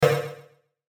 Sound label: button electrical burst; Buttons (Sound Effects:Multimedia)